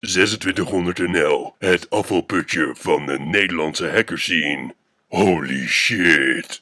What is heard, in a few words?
jingle